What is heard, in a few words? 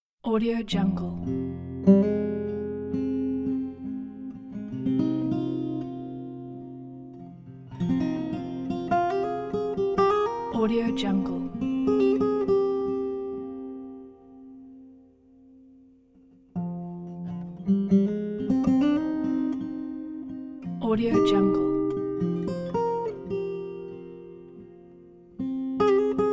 minor variation